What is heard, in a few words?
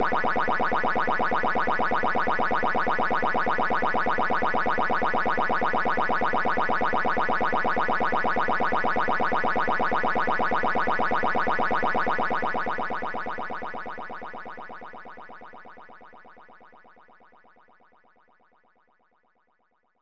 In-Game Ambience; pac man 2 the new adventures 1994 snes